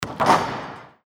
basketball hits rim

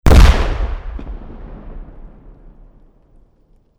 large explosion

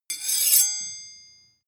sword blade grind ring

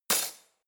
cutlery spoon drawer throw

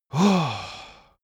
male relief sigh

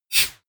whoosh metallic synth short

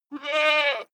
goat baa male

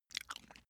cat eat single

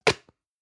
plastic can small lid close hard

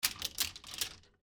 wardrobe

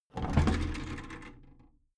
big plastic case lid lift heavy

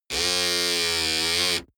servo low buzz

